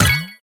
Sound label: collect; pinball